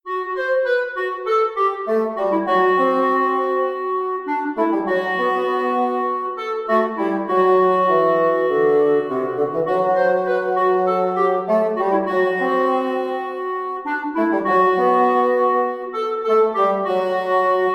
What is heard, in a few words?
F Locrian